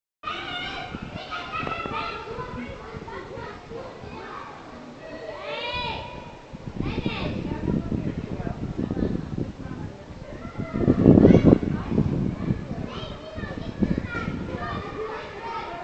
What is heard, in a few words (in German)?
schulhof; ludwigstrae